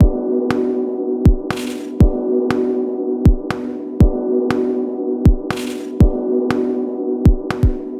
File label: future, pitchglitch loop